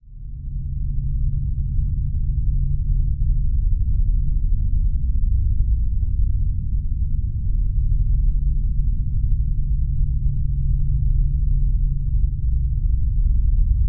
Drone Deep Menacing Dark Evil; Production Element Imaging; AdobeCC

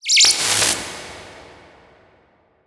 Title Transition Electrical Zap; Adobe; Production Element

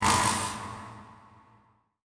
Transition Hit Impact Electrical; Production Element

